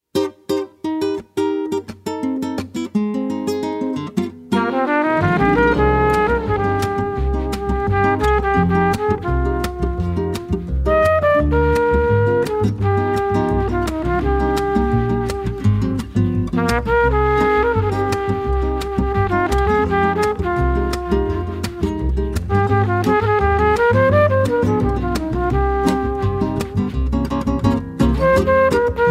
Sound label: Bossa